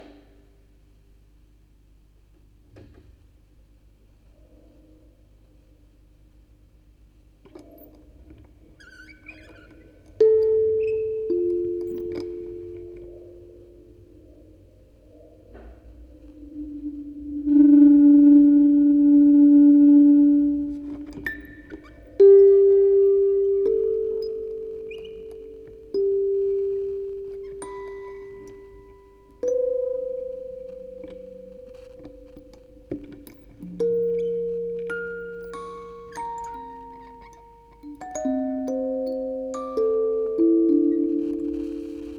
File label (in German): Aufnahme Spieluhren Quartett 4 Kanal stereo